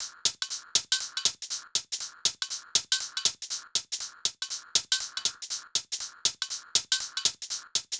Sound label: Hh Sh Loop 120; Equipped Music; Loungin House